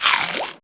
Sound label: bite; Feeding Frenzy